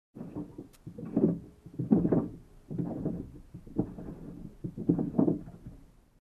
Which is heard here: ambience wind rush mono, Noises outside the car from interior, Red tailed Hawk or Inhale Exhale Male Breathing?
Noises outside the car from interior